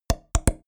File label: drop wood